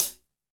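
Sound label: hi hat; HH Kits; Stereo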